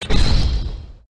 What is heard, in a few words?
fail sound; metin2